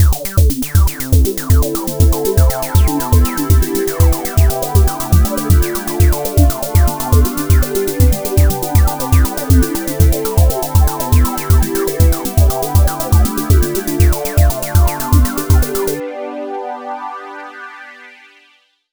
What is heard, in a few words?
chill out, synthesizer; lange-nacht-2009